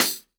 snare drum, jungle snare chromatic

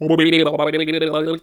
Mouth Fx, Vocal

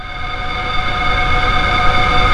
FX SPACE, JV-1080; Syntec; Wall of Sounds

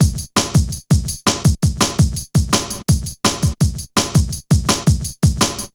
Hard Stepz B, Drum Loops 166.5

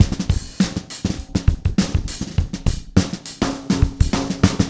Spungy Kit, Drums & Percussion Acoustic; Electronic Point Blank

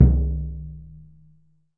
Surdo

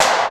SNR; Hollywood Edge; The Big Bad Beatmachine; XR-10

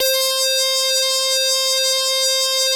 700 MOD1 C 1, Korg; Korg 700S; Back In Time Records; Korg Universe VOL-1

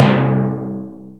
TIMPANI, PERCUSSION; Crypton - DrumBase5000

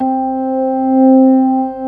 ORG B3 C4; Best Service Gigapack 2

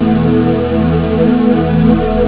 string; Amiga Soundtracker Sample Packs

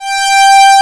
PA, ANA PAD

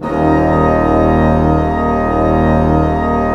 PLENO D1, Cathedral Organ, PEDAL PLENO; Propeller Island

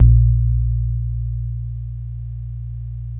BASS 1; E-MU Formula 4000 Series Vol. 1; Hip Hop Nation; Big Bottoms

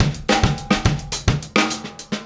Straight Kit, World Pop Beats

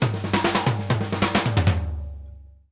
Drumworks SP fills 132; Sampleheads; New York City Drumworks VOL-1

